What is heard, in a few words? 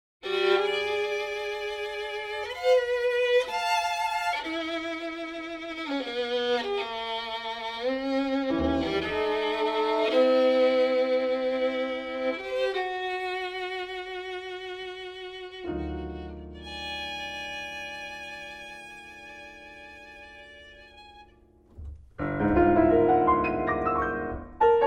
Sonata for Violin and Piano, Eigth=60; Walker (George)